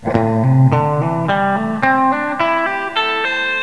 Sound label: hammer, guitar